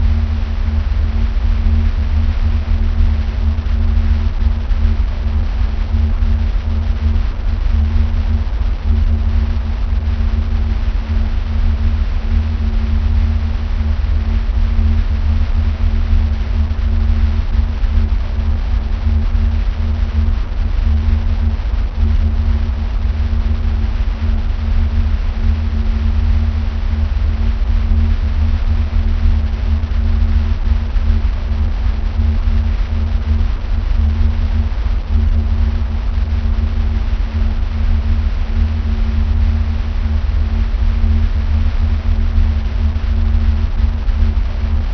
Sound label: drone slow 80